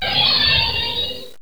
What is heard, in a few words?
land speeder; Star Wars